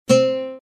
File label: oud sol